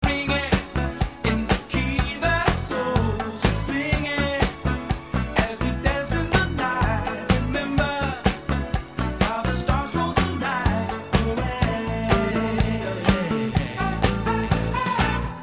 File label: Bass